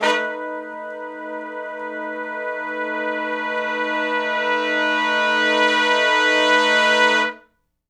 2 ms Long Note w Swell (B) har-dom 7th, Horncraft for R&B; Crimson Blue and Fabulous Horncraft 4 RnB; Sections